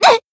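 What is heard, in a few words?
Voice damaged, Japanese; hoozuki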